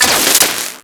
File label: Split Laser Beams Join, Weapons; Warframe; Corpus